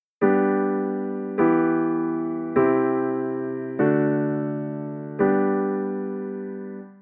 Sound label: Key of G I vi IV V I